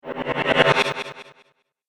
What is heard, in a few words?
Magical Sorcery Wave, magic sound effect; Andrew Fleming